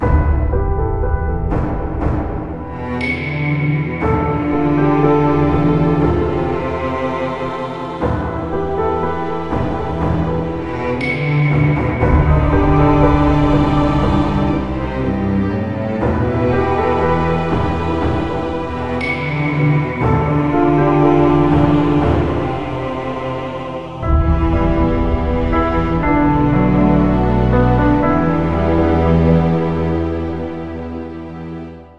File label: Lose Battle